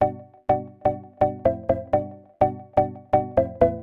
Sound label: Organ chops (125BPM), retro house